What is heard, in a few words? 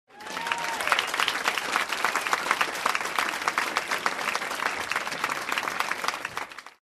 Talk Show Audience Applause